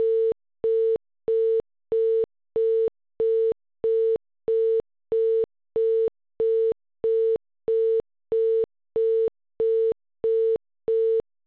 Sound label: busy